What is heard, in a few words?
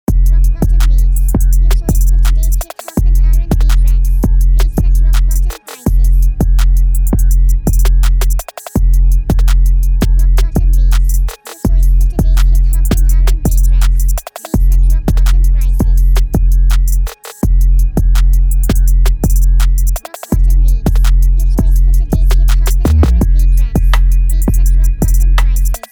BEAT